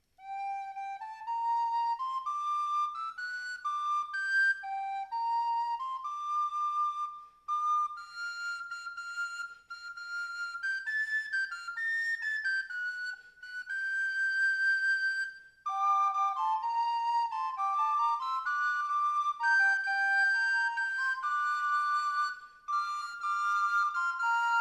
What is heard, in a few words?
Soprano, recorders; Moeck; Kyn